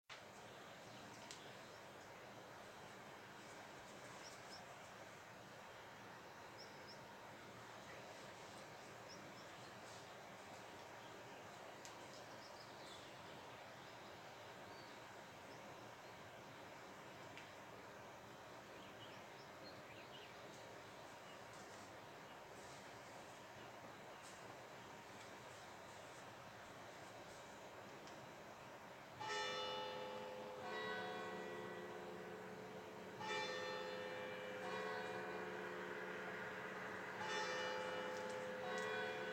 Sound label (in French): cloches